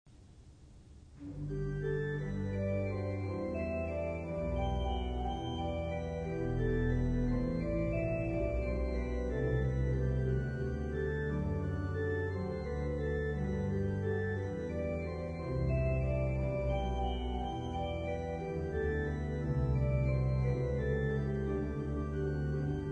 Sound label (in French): Trompette